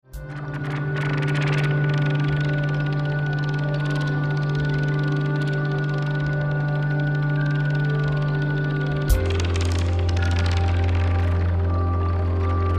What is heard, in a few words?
melody excerpt